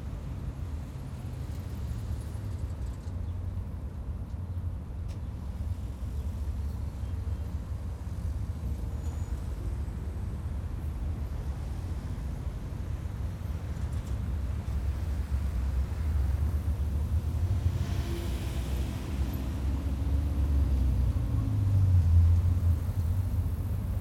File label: Ambient Town; DCS